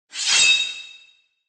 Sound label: Samurai